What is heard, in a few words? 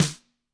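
Gretsch snare drum; ILIO; Double Platinum Drums 1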